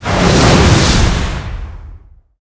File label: skill, skeleton god, monster